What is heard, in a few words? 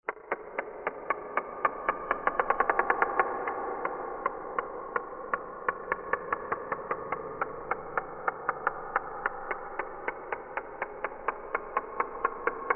mysterious machine